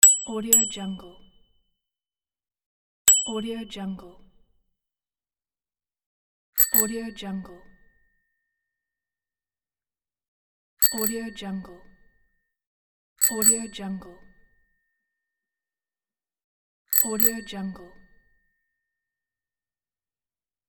bicycle bike bell dinging pack

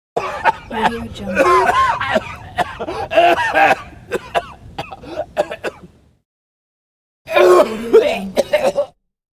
man coughing

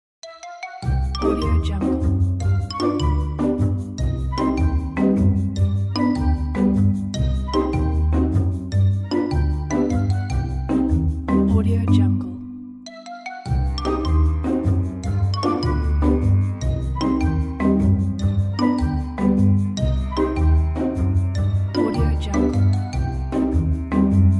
comedy music

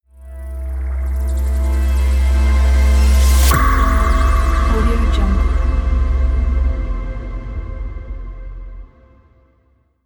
water drop logo reveal

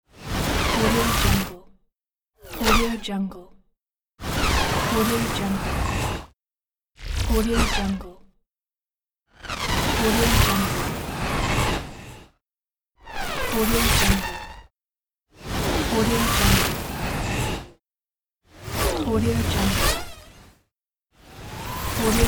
futuristic digital morphing whoosh

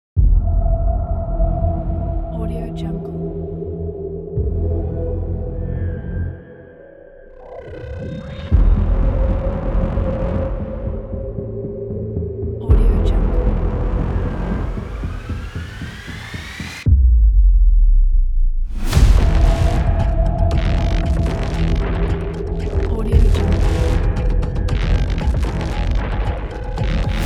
thriller trailer

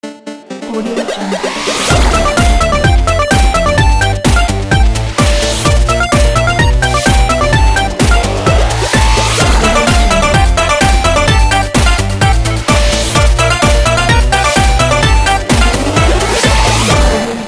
8 bit chiptune action logo

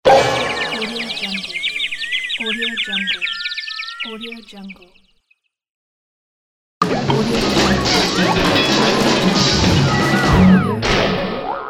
funny foley; cuetracks

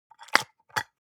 object bathroom soap dispenser press squish

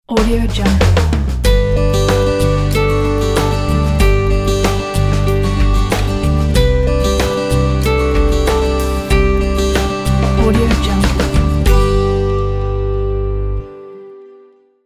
optimistic logo; crismusica

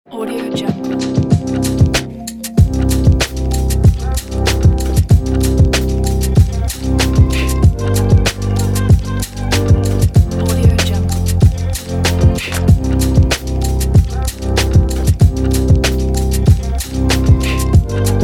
trip hop vlog background; qubesounds

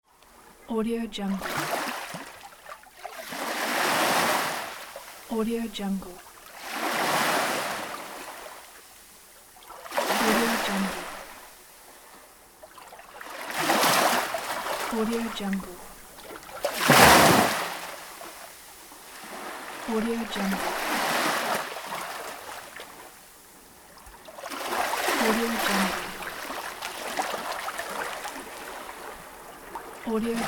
swashing water sea waves

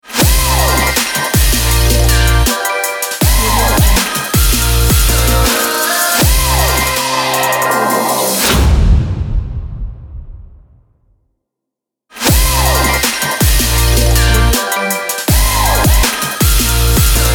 fun bass logo

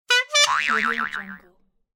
cartoon horn blow with spring boing

